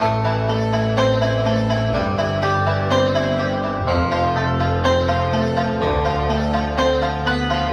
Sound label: guitar